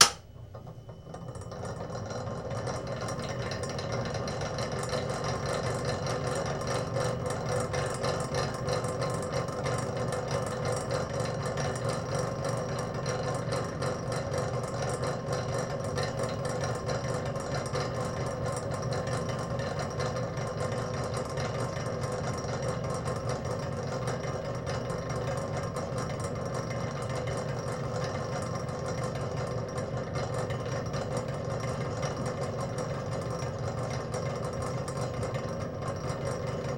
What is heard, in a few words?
Spinning Machine On Idle; Period Devices